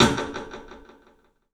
CLUB SN VRB, 3D Drums; 3D Sound

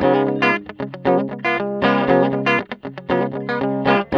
TELE 7TH D 3, guitar; Spectrasonics; Hans Zimmer Guitars VOL-1; TELE7THS

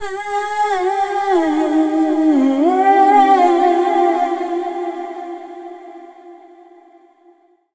Dance Vocals, FEMALE

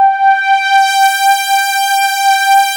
full analog; Sound Gallery - Charged

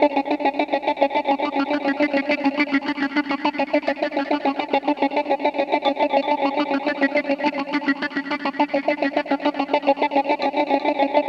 Keys Texture, Indie Rock Anthems; Sonic Foundry (Sony Creative Software)

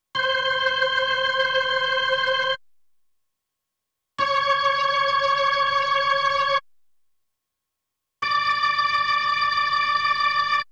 B3 Organ; Masterbits CLIMAX 8

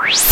PYUUN, PERCUSSION

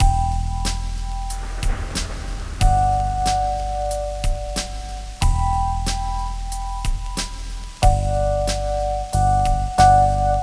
LOFI; USB Soundscan vol.47; AKAI; Hip-Hop & R&B

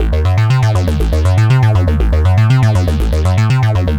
Arpeggio Bass, Prototechno

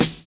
JAZZY; Univers Sons

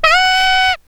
TURNTABLES, Hip Hop; InVision Interactive; 40 Oz Phat Slammin

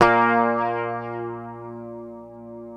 PICKED, Bass, A#2; Sampleheads; Will Lee Bass Library

